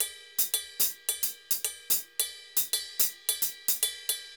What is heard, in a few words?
Ride Murga 110 bpm; Best Service; Festa Latina VOL-1